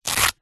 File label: foley wallet open velcro fast; zapsplat